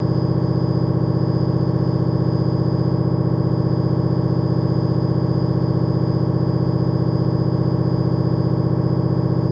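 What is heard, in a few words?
Mri Test Small Test, mri magnetic resonance imaging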